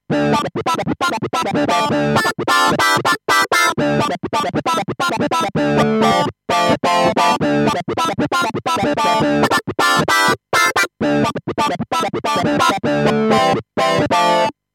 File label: Funkesizer